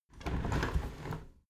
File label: window handle squeak turn open soft; objekte; fenster